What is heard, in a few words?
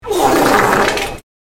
Disgusting meme fart